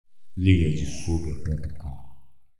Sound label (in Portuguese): vinheta